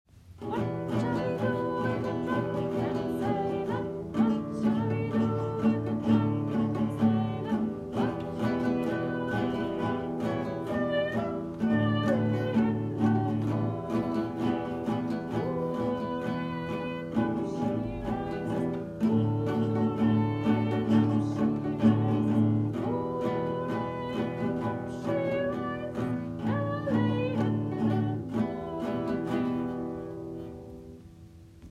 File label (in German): Gitarren AG